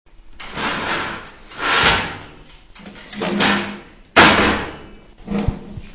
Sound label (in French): texture barricadage